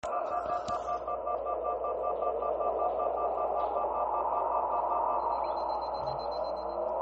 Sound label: shield; Halo